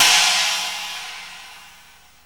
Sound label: DEEP CRASH, CYMBALS; Back In Time Records - Big Bang